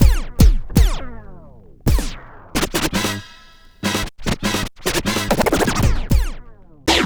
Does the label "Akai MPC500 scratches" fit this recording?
Yes